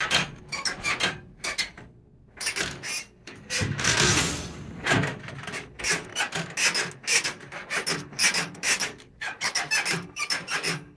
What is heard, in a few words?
rhythmic metallic bed spring squeaking